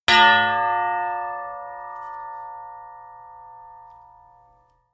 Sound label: sword hit sweetener